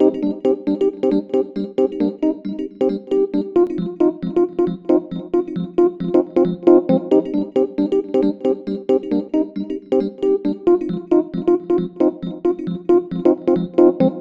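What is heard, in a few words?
electric piano modulated dance 135 bpm C minor; lead; instruments; loops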